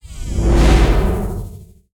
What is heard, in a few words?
transition pan; bluezone; sonniss gdc